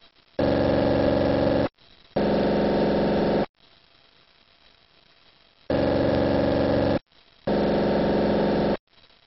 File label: autopage